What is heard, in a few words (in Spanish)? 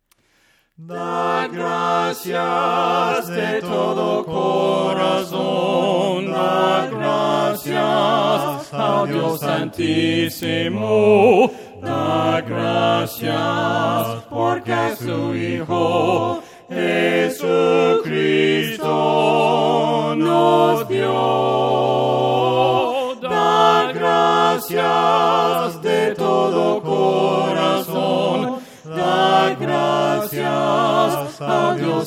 VOCES; GRACIAS